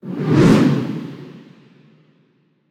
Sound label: Wind Bolt; SNKRX